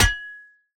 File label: pickaxe strike; rust